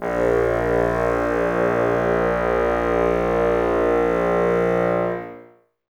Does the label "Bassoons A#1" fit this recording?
Yes